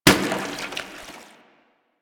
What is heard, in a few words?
Water Balloon Impact; Warframe; Water Balloons